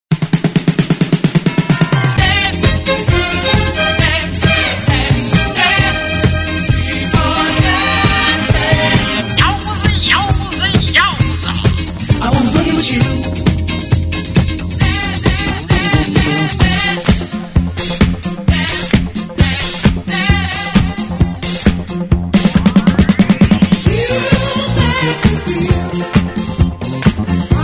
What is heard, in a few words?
Disco Gold 70